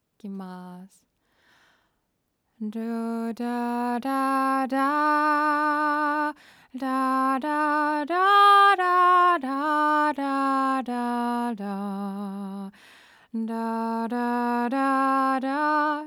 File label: Vocal Close